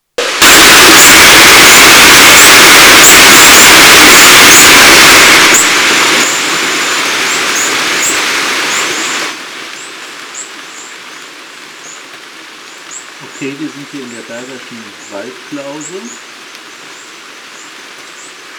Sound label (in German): regen schrill is von innen nach aussen; Berger Huette